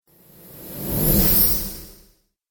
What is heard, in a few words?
Warlock Magic, magic; Magic Sound Effects; Role Playing Game; Andrew Fleming